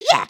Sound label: headbang; emotes